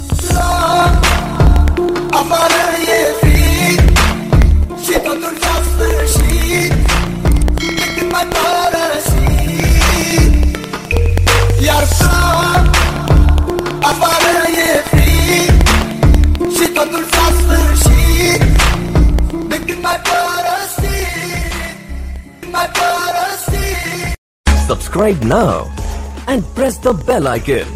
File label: viral ringtone, english ringtones